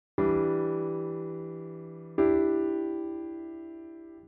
Cadd9

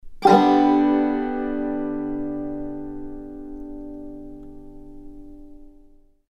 Banjo G

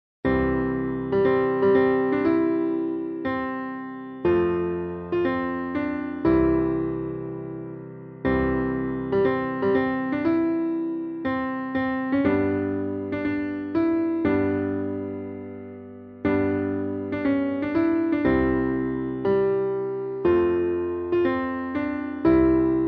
Railway Melody

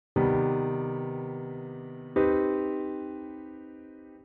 Cminj7

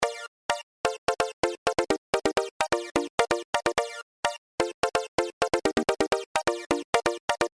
BackBeat

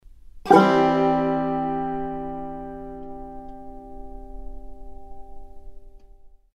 Banjo C

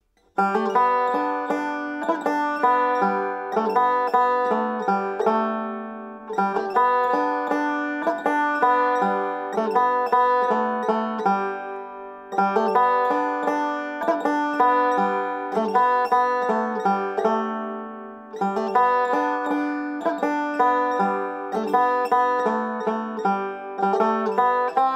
Banjo Solo

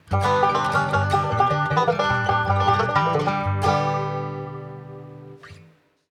Bluegrass Banjo

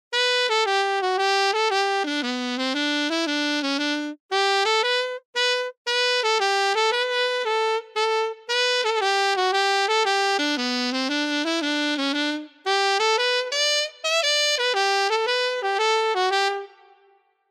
A sax

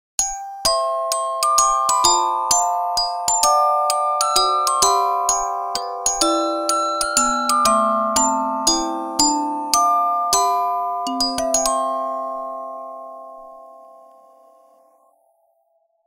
Xmas Music Box

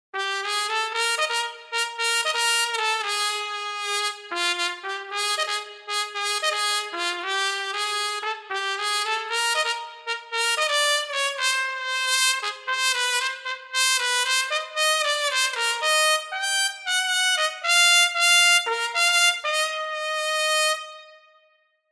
Trumpet sample

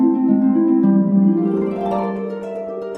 Harp gressando medium F major

